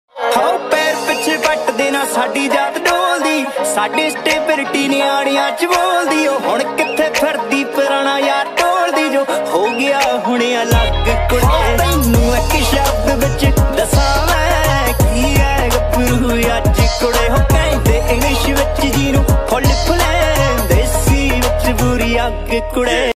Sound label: Desi Punjabi Ringtone